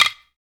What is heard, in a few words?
Sticks; SB Sticks